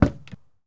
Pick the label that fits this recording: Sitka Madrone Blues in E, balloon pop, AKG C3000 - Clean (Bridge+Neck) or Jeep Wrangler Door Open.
Jeep Wrangler Door Open